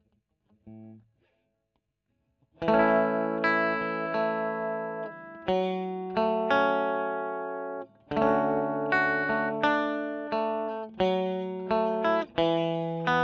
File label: E. GTR Royer